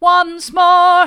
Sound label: once more hard, vocal